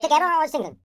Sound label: YODEL YELL, World Voices